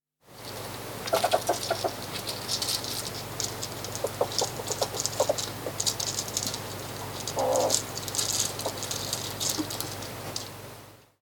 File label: noise; Noise of two, one running up tree, other commentating